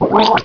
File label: drown